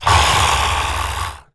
zombie attack; DeadFright

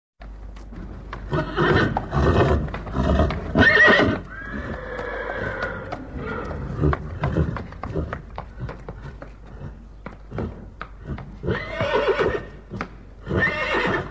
Horse Walking And Neighing; Sound Ideas; Series 2000

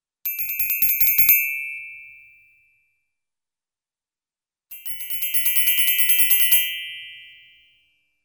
Rhythmic Bell Dings (glockenspiel), Cartoon; Hollywood Edge; Cartoon Trax

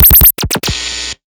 Areola Scratcher; Plastic Surgery

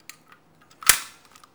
Gun Handling Weapon, Weapons Foley; SFX Kit

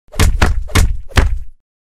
Kick Combo, Martial Arts and Human Impacts; Hollywood Edge

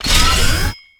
Gun Draw Select Scifi, Weapons Foley; SFX Kit

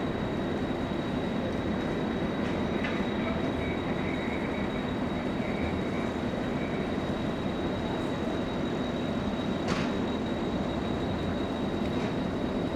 trainstation ambiance; best service Blue Box

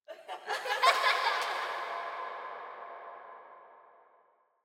Eerie Children Laughter; Hollywood Edge; sound designer's toolkit v2

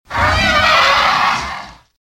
Velociraptor - Hurt; RadwinIsland

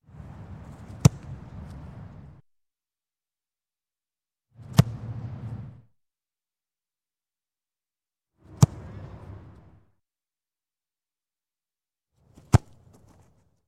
Football Kick Ball; Sound Ideas 2000 Series

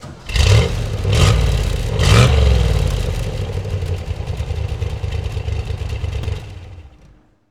65 Corvette Start Rev Off, Automobiles

